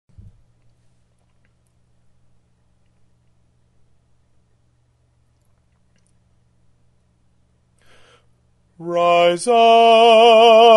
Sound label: - Baritone; Rise Up, O Men of God